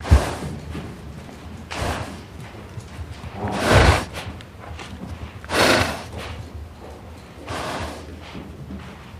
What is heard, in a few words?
Horse Breath Loop